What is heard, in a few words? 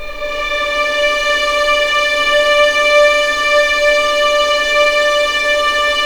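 D5 legato violin, right, The Ultimate String Collection; East West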